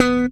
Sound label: Spector pull, Bass